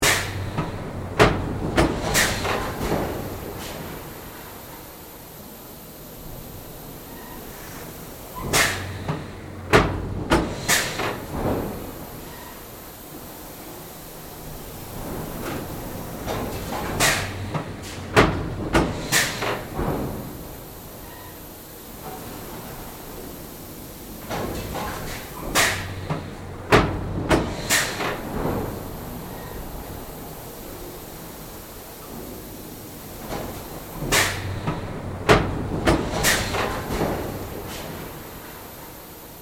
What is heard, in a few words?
Factory Stamping, Industry, Press, Pneumatic, Hiss, Loop; General, Motors, Gm; Stamper; BlastWave